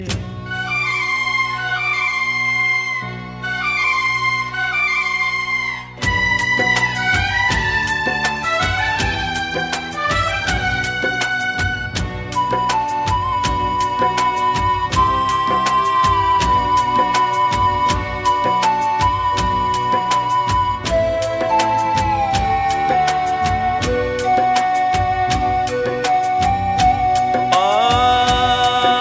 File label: Flute